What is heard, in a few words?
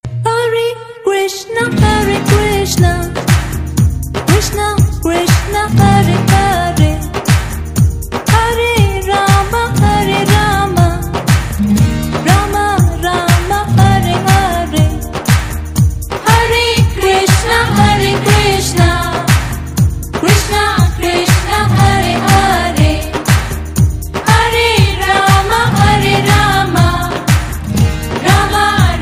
hare krishna Hare English Female Voice Ringtone